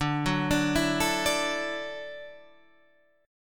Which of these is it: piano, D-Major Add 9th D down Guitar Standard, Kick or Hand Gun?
D-Major Add 9th D down Guitar Standard